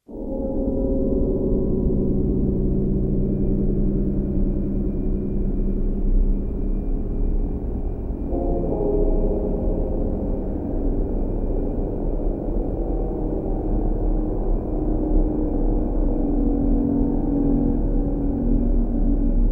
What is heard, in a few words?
ambience